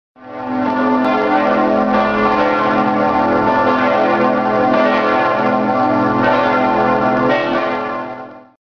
Bells 2 Tone

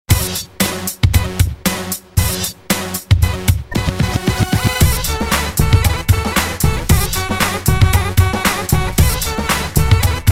Balkan beat

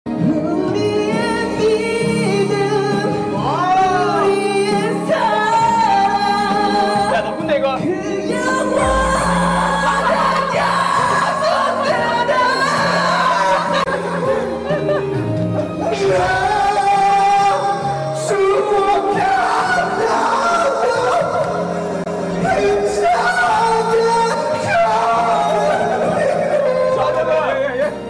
Karaoke

